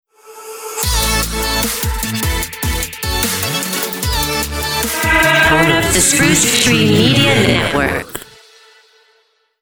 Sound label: Stinger